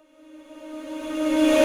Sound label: Tonal Reverse - D#; House; FX; Conversations Tonal Reverse